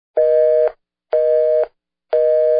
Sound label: phone busy; teahouse